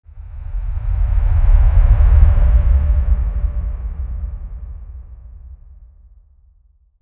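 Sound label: a deep ominous dark swoosh; energy sweep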